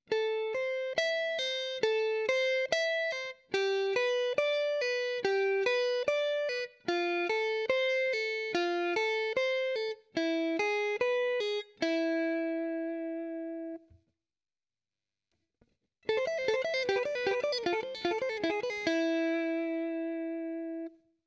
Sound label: Sweep Lick Arpeggio Progression 2-String